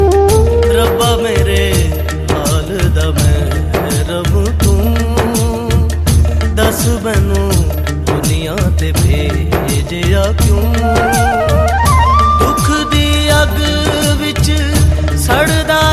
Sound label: Old Hindi Song